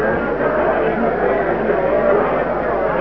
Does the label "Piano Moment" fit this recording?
No